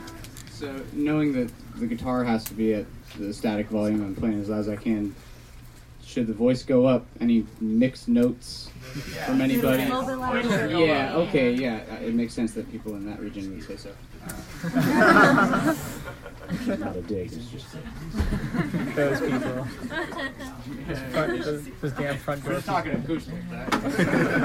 Soundcheck

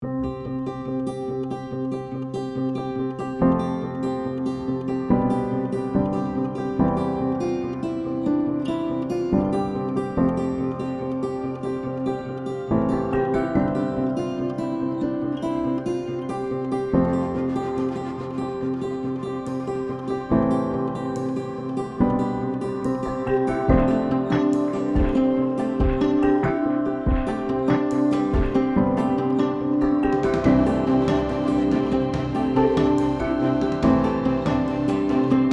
INSPIRATIONAL

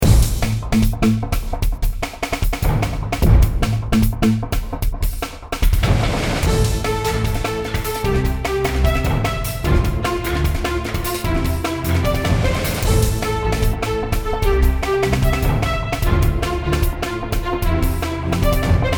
Orchestral Techno

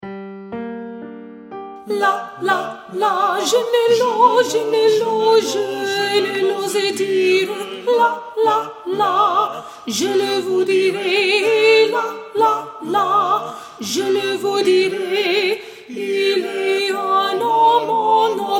Soprano; Je le vous dirai!; District 9 Middle School Honor Choir

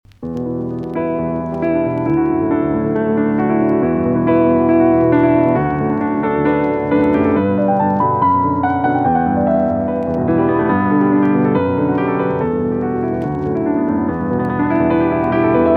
micropiano

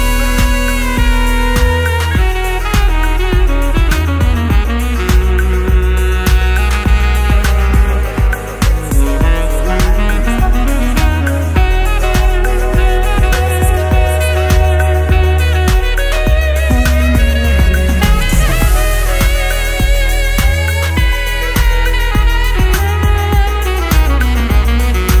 Tenor Sax

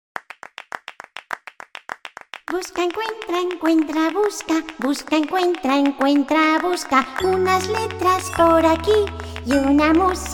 Rumba

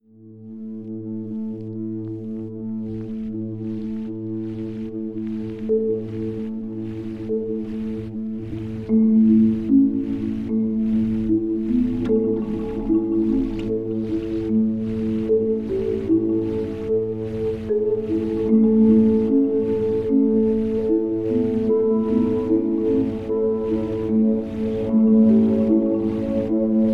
Lullaby

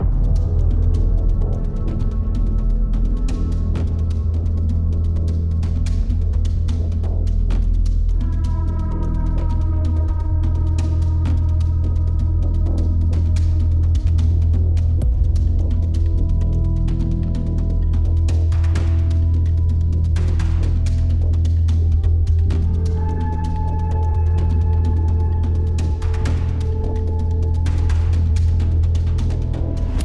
Tension Loop

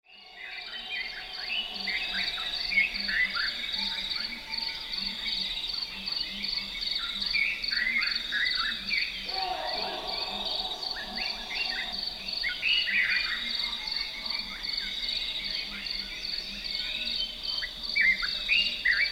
African Safari

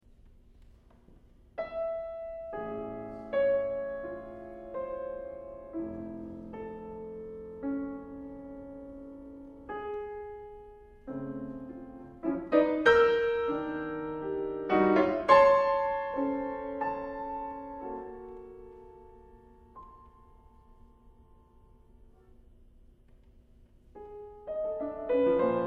Piano; Composition samples

